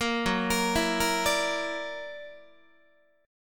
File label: Gb Augmented 7th Gb down Guitar 4A,4E,5A,5D,5G sharp,6C sharp; Gb+7 arpeggiated